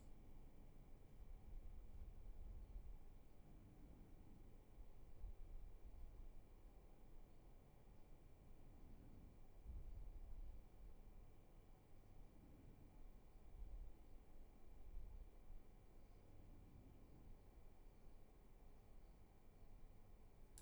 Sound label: roomtone